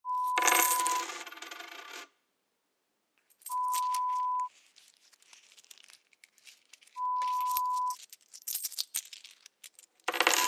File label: Loose change sounds